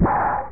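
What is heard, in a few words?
door open; ReaderGames; TankedUp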